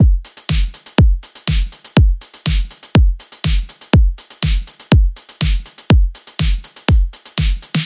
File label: 122 BPM Full Drum; full drum kit; Battery; Forge